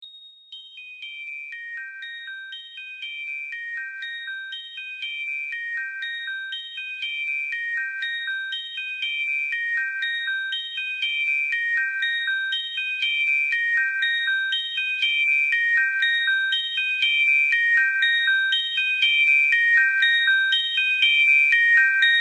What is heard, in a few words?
Ascending